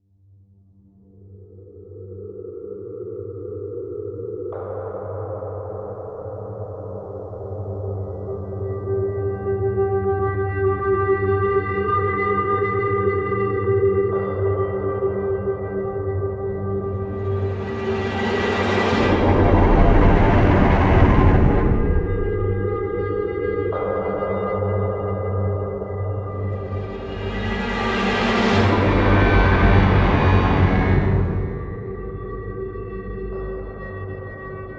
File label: Suspense Oneshot